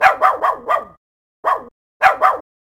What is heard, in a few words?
Bark